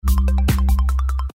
sms popcorn

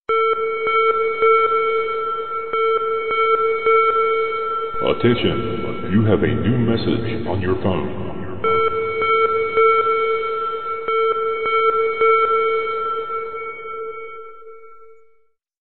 new message

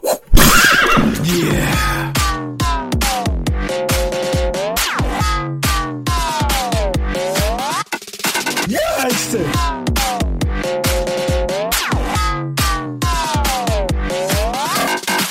steel music

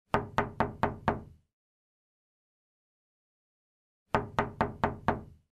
Notification Toc Toc

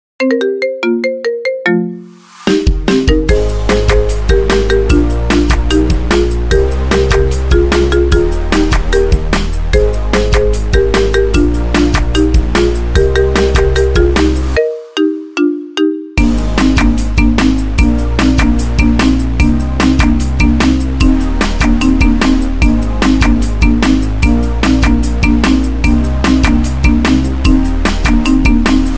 Marimba

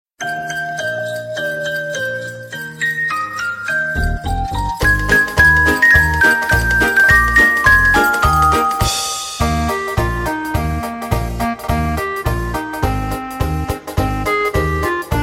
jingle bells musical

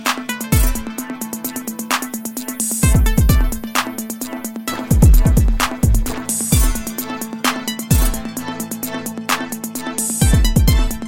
samsung old school

